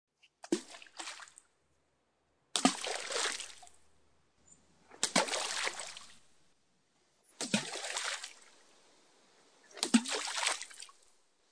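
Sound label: sound of stones thrown into water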